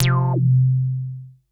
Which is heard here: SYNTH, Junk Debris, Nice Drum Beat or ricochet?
SYNTH